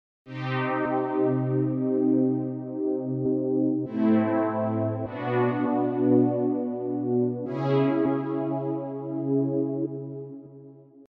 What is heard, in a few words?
pan synth; shaperbox